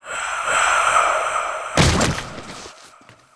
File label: big mud man die